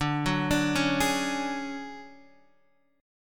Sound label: D Major Sharp 11th D Guitar DADGAD, chord